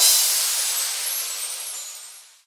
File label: normal hit finish; osu; hitsounds; deadcodehs